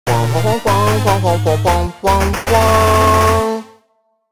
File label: Jingle Dungeon Start; Dandy Dungeon Legend of Brave Yamada